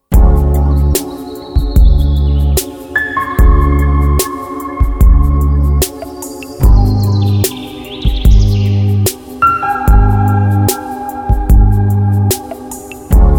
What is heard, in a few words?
Morning, alarms